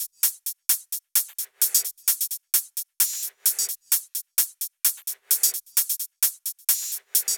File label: 130BPM Kit; Vengeance Samples; VFH1 130BPM Danzon Kit